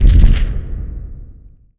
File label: small explosion